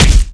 melee punch; weapons; Modern Warfare

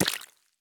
run puddle; Escape from Tarkov

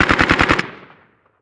gun ak47 dist

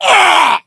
death

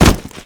foley generic slam wall; Call of Duty Modern Warfare

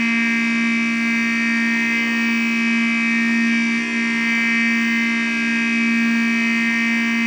Aircrafts Mi-8MTV2 Jump Alarm; DCS

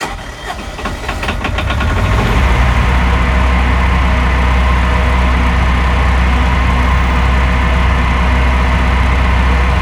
Diesel Engine Idle; DCS

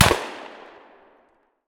mr43 fire far; Escape from Tarkov

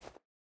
footstep barefoot snow jump start; Rust

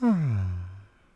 Buddist Ahh; Civ4 warlords

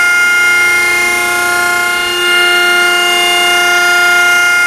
Vehicle Weapons

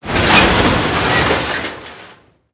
metal construction; World of Tanks Blitz

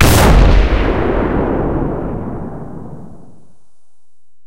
cannon 120mm Rh120 shot, cannons tanks; War Thunder

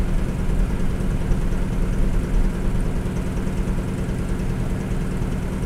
hellcat cockpit l, engines; War Thunder

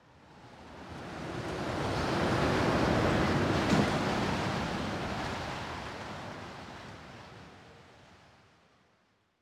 wind buffet

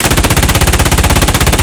Automatic M3P, Weapons; DCS

